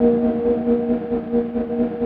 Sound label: GTR DRONE, Synth FX Misc